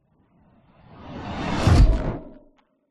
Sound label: fantasy magic spell zap whoosh cold ice crack impact